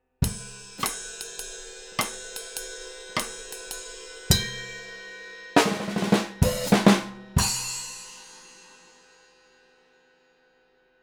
XVive Mikro NT1A Drums Funk

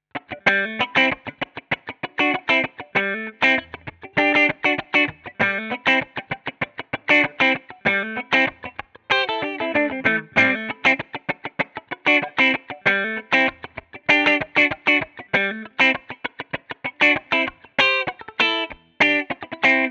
Vintera II Tele Deluxe Funky

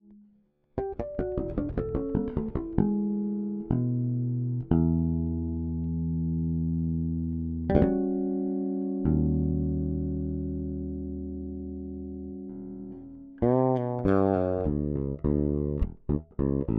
Bass Pedal Fretless Bass; API Audio TranZformer LLX

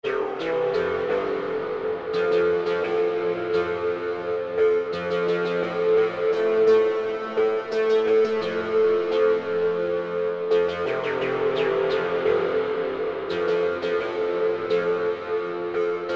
MS-20 Hall Wet 100; Metric Halo v4; TaiCHi; Lucis

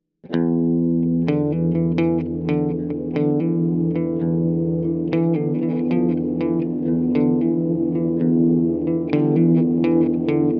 Fundamental Ambient Lush; Walrus Audio

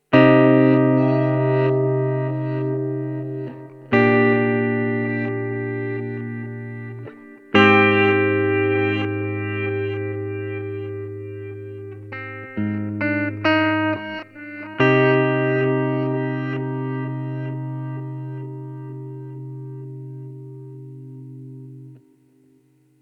Mooer GE1000 clean Reverse Delay

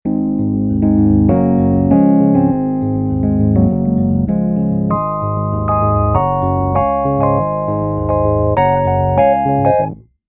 Nord EP7 Amped Tines

